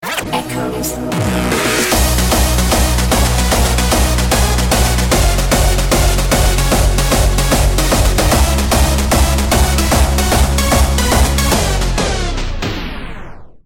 Hardstyle

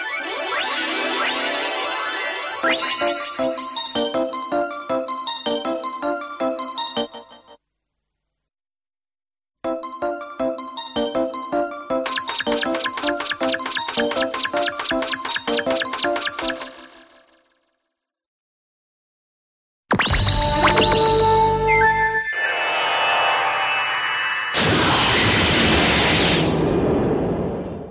Mixed Ringtone

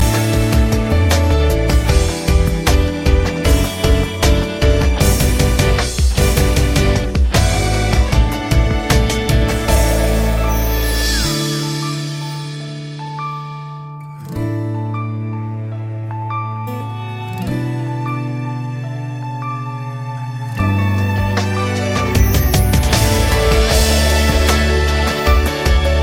So Beautiful Inst

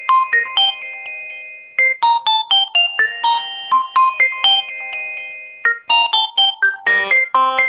I-phone Message

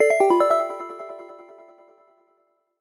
Sms

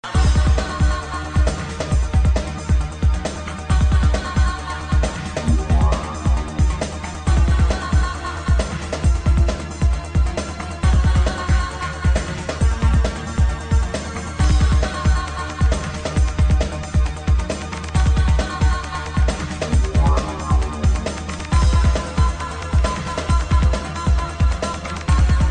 Techno; Techno Viking

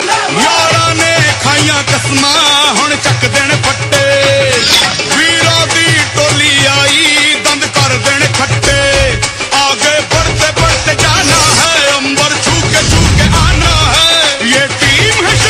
Dhoom Punjabi

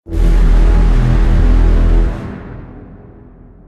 Reaper Sfx; Me3

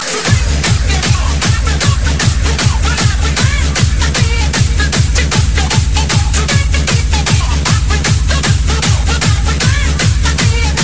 HARD STYLE

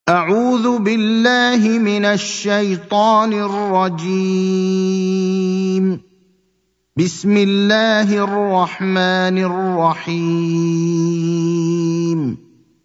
Quran

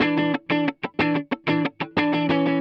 Twang

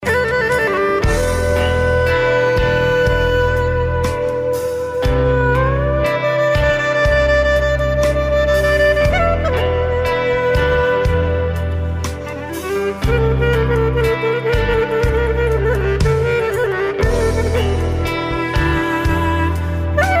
Clarinet

